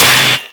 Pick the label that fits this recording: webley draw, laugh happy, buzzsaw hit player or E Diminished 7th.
buzzsaw hit player